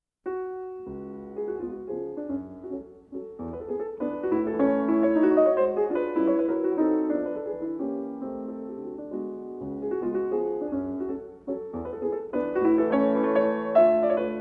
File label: Mazurka N 1 in F sharp minor, Op.6-N 1, Piano